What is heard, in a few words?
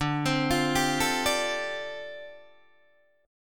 D Minor 11th D down Guitar Open D